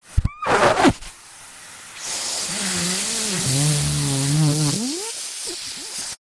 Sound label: household vacuum item get sucked into hose suction